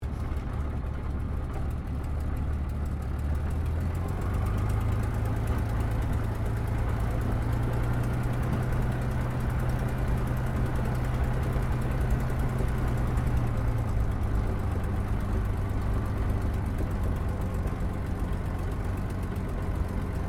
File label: AIRCRAFT SUPERMARINE SPITFIRE MK-VIII TAXI INTERIOR STEREO; Airplane Sound Effects